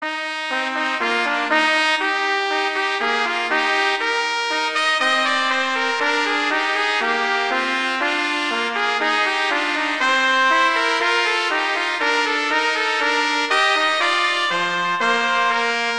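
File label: Duet, horn; Mozart; Duets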